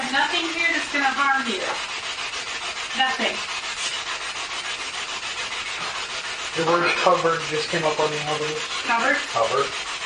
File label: Spirit Box; Upstairs Bed; jennie wade house investigation